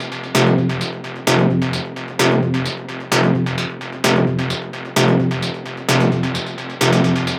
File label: Synth Loop 130 F, Techno; Loops; Synths; GhostHack; Ghosthack - Free Techno Sounds 2024